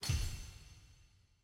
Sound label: mission pane restore click; tft sgo2021